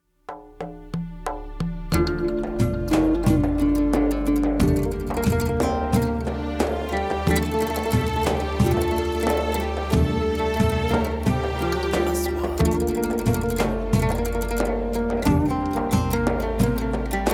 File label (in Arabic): موسيقى تصويرية خليجي; khaliji